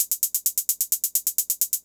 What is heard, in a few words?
16th Closed Hat 130, Perc Loops, Old Skool House